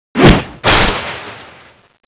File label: lance slash